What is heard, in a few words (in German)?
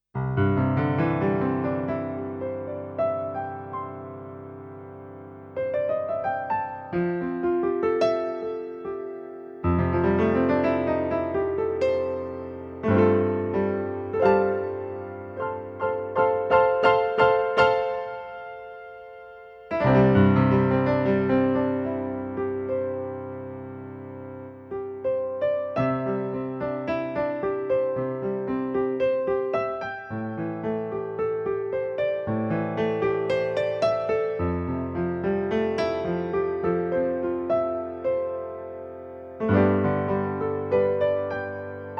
SP5100 Klavier